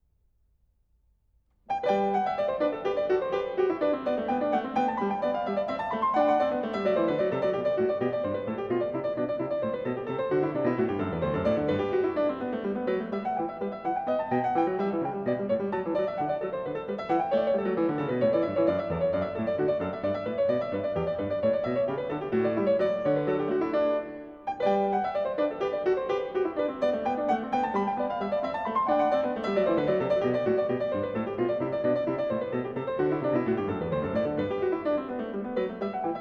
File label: french suite no 5 in g major courante; johann sebastian bach; bwv 816